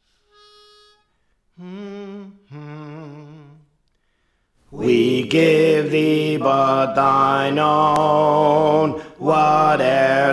Alto; Praise For The Lord Training

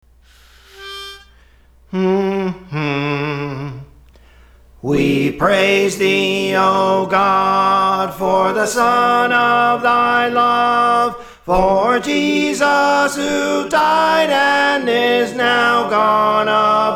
Soprano; Praise For The Lord Training

